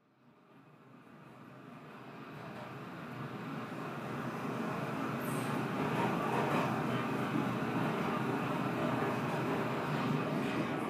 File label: Transition